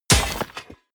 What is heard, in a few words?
clang rattle dirt; whooshes rises; hits impacts